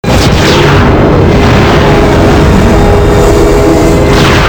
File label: wormhole